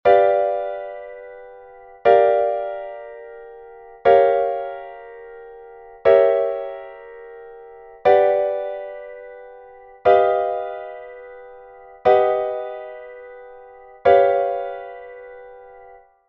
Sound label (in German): septimenakkord; riemann 1882